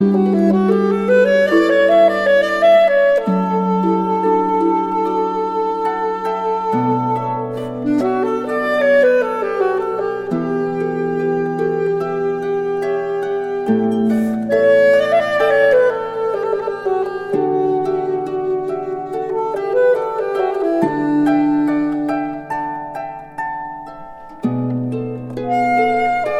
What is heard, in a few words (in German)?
Adagio Altsaxophon